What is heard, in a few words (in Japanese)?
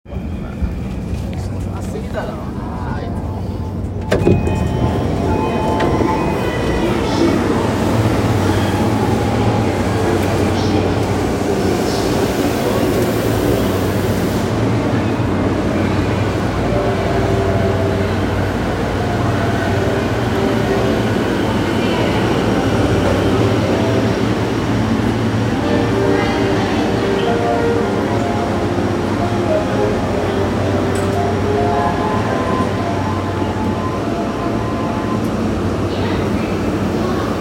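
山手線 上野 counterclockwise, train sounds; JR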